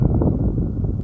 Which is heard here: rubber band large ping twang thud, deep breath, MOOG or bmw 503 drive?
deep breath